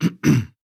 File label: cough; krunkitis cough